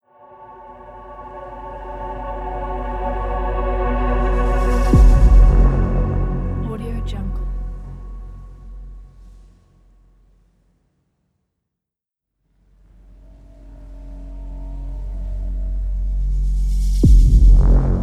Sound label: space boom logo